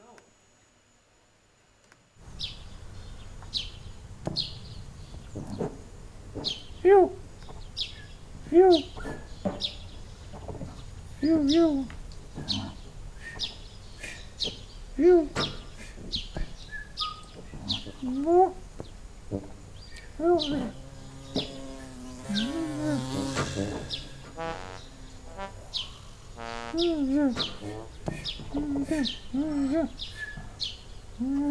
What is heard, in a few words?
birdsongs